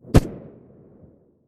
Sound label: glock17 silencer mono far